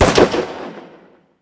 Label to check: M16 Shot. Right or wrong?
Right